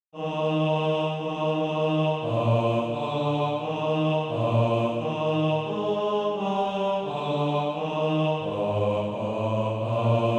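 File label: bas, Practise Music; Bass; SATB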